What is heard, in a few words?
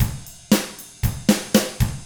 ROCK